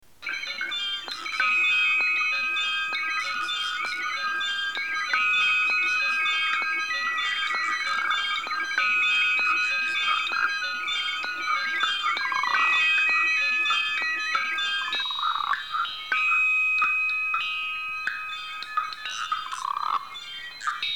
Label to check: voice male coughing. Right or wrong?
Wrong